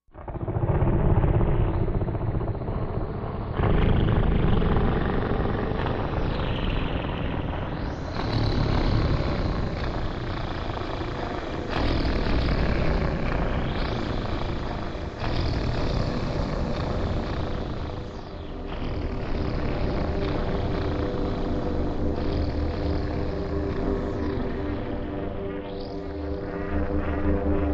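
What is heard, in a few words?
Demon Dreams Low Creature, eerie ambience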